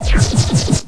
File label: Torp Anti Grav Effect